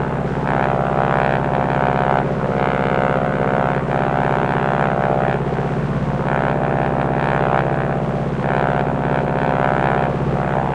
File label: plane; World of Tanks